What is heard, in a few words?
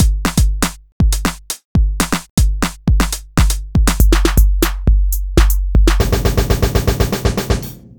Groove 120bpm; GroovePizza